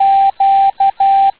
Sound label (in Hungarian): Távíró q